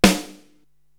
SD Full Room, Korg TR-Rack drum machine

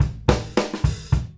FILL

